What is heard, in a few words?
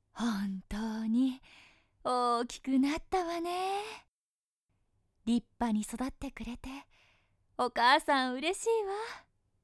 haha